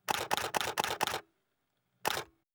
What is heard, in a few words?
dslr camera canon 6d multiple shots with autofocus; pov